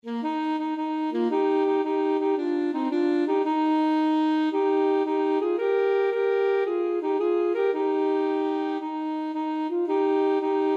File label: alto saxophone duet